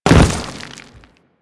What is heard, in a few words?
Step Dirt, Footsteps; Enemies; Warframe; Grineer